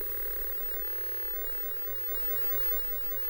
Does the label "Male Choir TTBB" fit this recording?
No